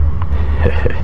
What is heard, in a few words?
"HEH HEH"